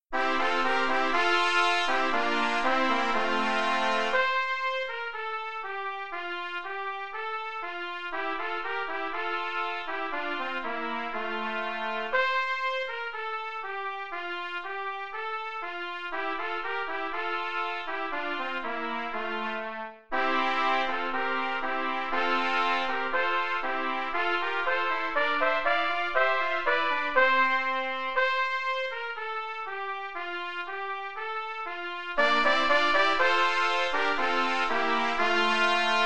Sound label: xmas trumpet trios